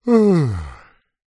human male middle aged yawn restful happy; zapsplat

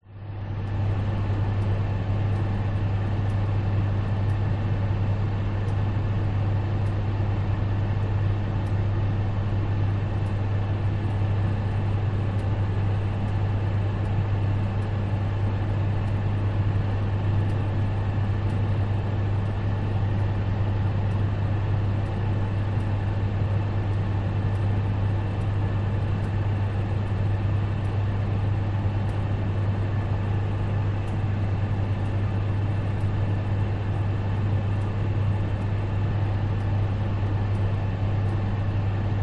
oven electric whirr fan; household; ext

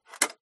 Cassette Open

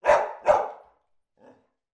animal dog labrador barking internal

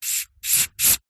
household aerosol can spray short; zapsplat

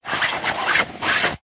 tin very small circular lid on spin; foley; zapsplat

